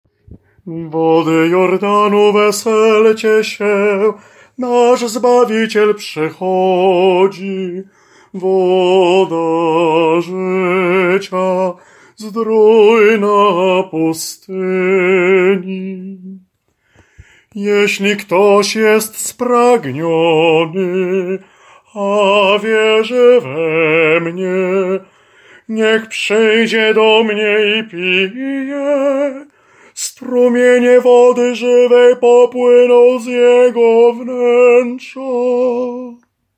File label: SOPRAN; Wody Jordanu